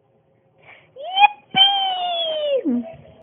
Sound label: yippee